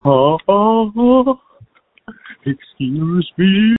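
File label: Scream, phone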